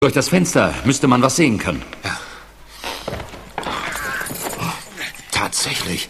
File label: aargh